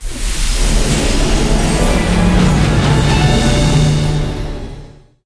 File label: random Wild Start; fairyred